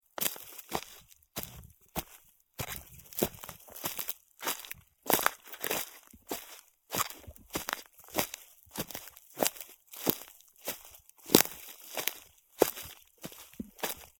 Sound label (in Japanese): スニーカーで草の上を歩く音; sneaker